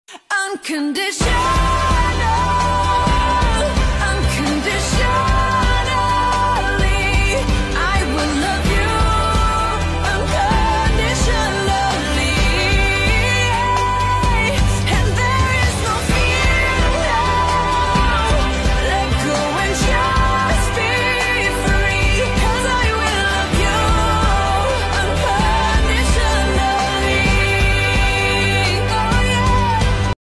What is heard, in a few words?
english ringtone